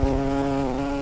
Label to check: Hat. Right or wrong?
Wrong